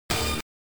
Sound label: pickup tone